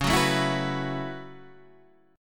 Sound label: B 7th Suspended 2nd Suspended 4th, chord